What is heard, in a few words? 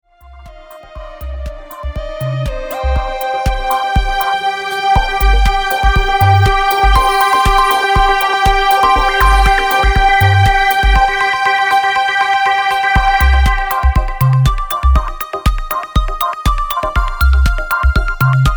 German lounge